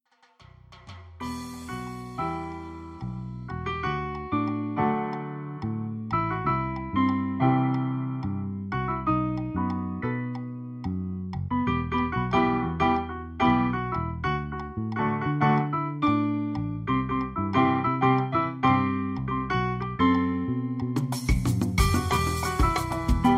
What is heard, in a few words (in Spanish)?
Salsa